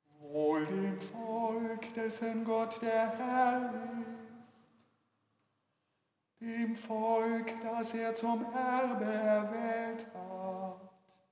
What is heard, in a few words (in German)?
psalmton